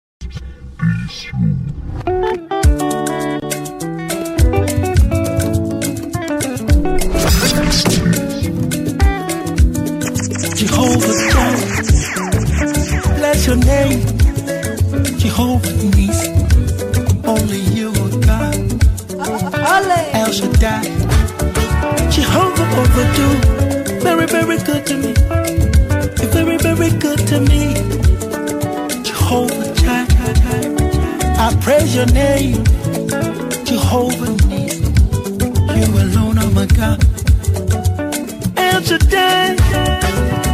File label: Ghana Gospel Praise Mix